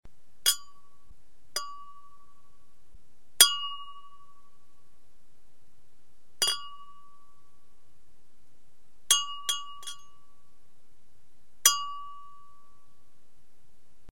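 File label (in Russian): стукаются винные бокалы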